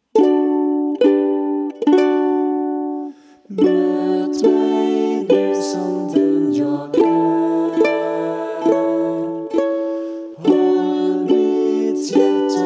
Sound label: ukulele